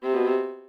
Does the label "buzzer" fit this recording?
Yes